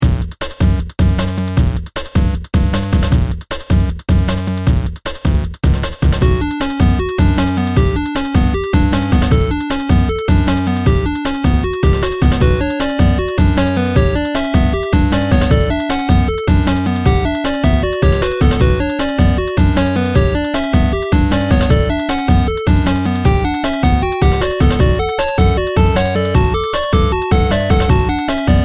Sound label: 8-bit